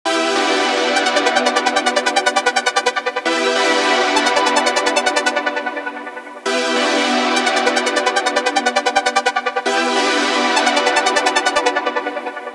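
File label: synth loop outtro 150 E minor; Virtual Riot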